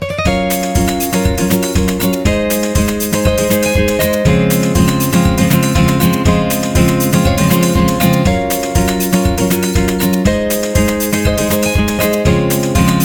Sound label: Beauty samba